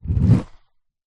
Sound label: leisure rollerskate short roll ground lift spin air